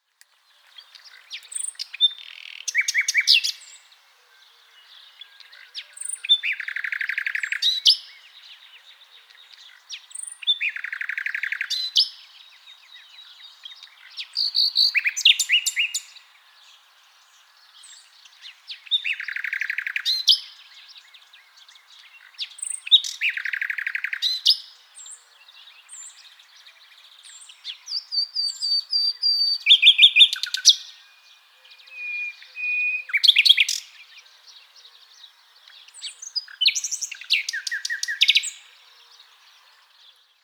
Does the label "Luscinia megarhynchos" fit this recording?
Yes